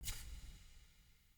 UI Default Theme Grid Open

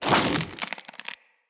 Root Spider Mother Foley Leg Swipe, Spiders

